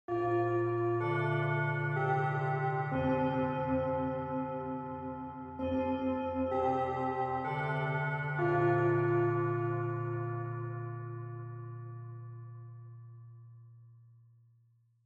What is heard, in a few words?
Japanese School Bell Far Mid